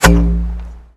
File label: mortar tube; WAR